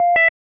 Beep

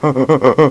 huha huha; Web Clip Empire 150,000

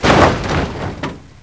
ROLL; mtm2demo; PC Gamer 4.2

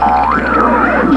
Boing

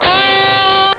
guitar, string instruments; Sound Effects for Multimedia - Volume 1

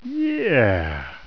got letter; Hang2000 v1.1

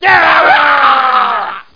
dieing

